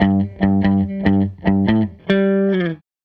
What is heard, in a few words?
Les Guitar Loop